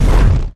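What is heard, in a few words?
short sound of fireball hitting target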